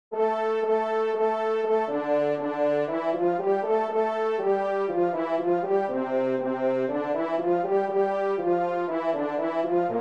Horn in F

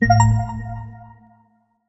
speaker test; Steam

